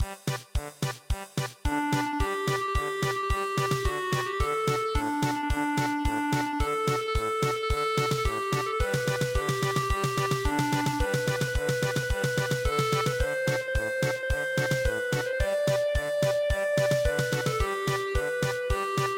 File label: welcoming song polka